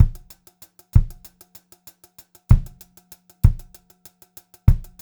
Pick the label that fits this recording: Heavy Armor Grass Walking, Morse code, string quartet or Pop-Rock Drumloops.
Pop-Rock Drumloops